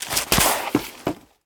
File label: Bodyfall Concrete; SFX Kit; Bodyfalls